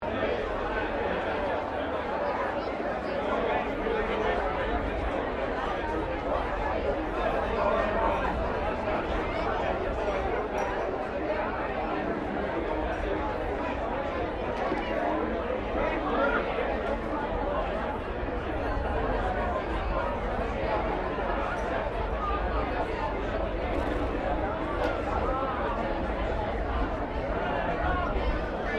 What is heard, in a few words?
Ambience Noosa Resteraunt Cup Day Crowd Loop, Melbourne Cup celebrations in Noosa Queensland